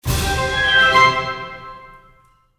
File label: small win; netent